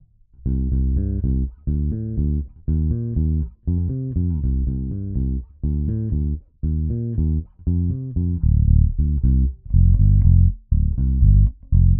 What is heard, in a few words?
pillow soft